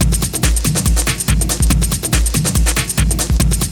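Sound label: drum loop; X-Static Goldmine 3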